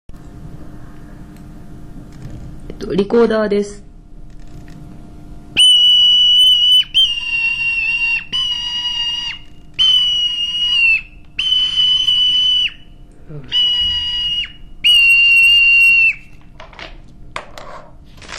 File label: recorder